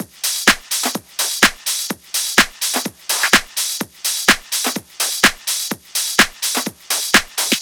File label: 126BPM Drums, TechHouse; Offshore; Vengeance Samples; Bonus TechHouse Kits